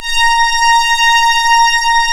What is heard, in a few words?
ORGAN, A#; Big Fish Audio; Synclav FM